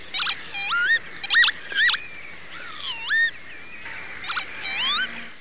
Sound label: lapwing; Friesland